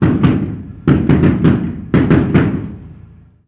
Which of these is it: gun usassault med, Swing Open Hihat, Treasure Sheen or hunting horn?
gun usassault med